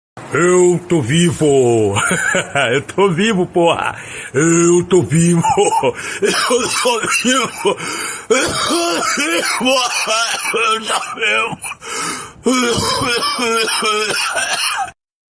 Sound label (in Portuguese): tosse